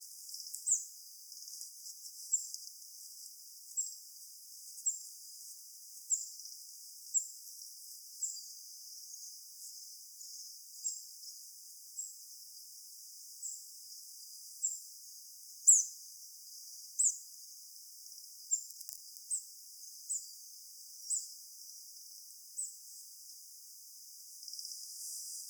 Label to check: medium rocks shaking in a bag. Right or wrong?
Wrong